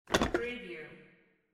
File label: coffee pot pick up